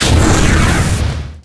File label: fireball fire